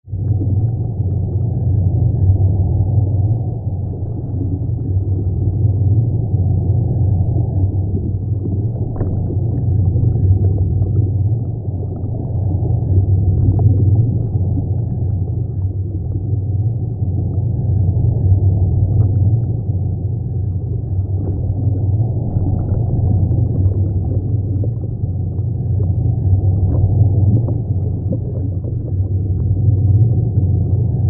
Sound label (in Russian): Glubina